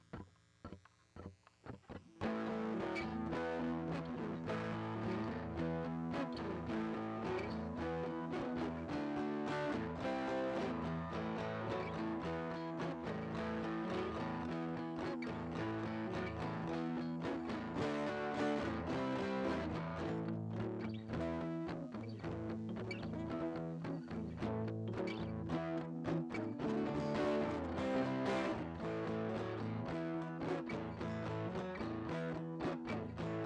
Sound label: riff in A e d-c; riffs